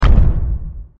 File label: step; slender; guardian; foot